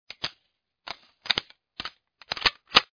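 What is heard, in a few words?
Assault Reload; CastleMiner Z; Xbox 360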